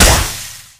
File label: hit; 3rd degree; video games; source engine; tf; weapons